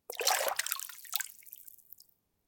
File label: Shallow Water Movement; children of morta